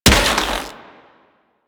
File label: Rifle Charged Shot; Warframe; Infested; Quanta